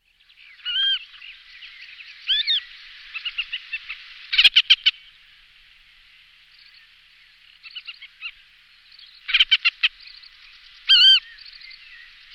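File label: Circus cyaneus, accipitriformes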